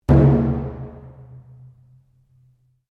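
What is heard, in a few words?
Drum Taiko Odaiko mezzo forte, Large Japanese Taiko Drum; Miscellaneous Musical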